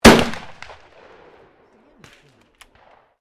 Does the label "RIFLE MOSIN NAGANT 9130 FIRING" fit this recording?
Yes